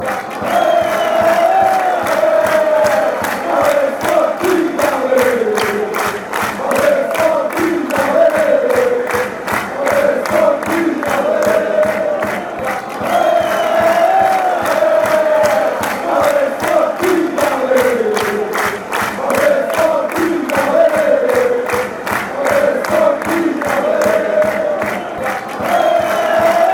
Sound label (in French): allez allez sporting allez, chants